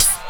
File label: HH, Dance Mega Drums; Best Service; HH-HIP